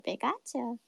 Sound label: pikachu; noises; SpaceRyS